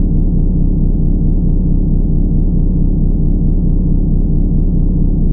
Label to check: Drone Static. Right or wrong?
Right